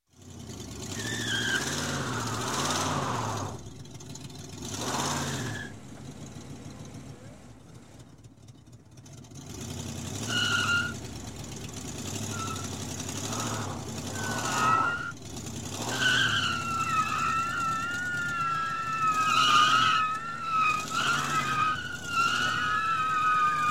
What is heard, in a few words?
Auto, Tire Squeal Squeal Tires While Separating; Squeal Tires While Separating 2 Bumper; Sound Ideas 2000 Series